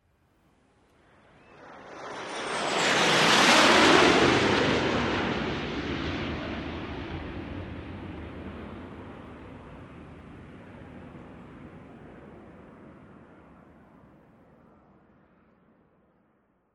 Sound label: F18 Diamond Fly by; SOUND EFFECTS1